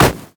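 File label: sad mummy basic attack; LoL; amumu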